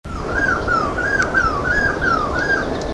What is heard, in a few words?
brown mesite; Madagascar - 20th Oktober to 16th November 2003